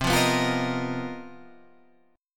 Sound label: B Major 7th Flat 5th C; strummed; BM7b5/C; JGuitar